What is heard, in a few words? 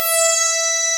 SYN, SYNTHESIZER; DIGI WAVE #1